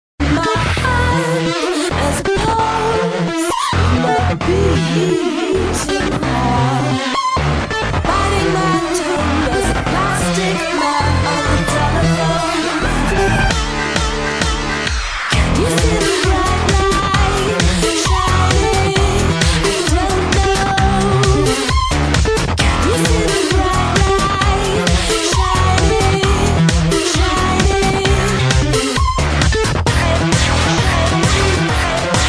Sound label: Electro